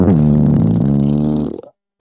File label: fart rumble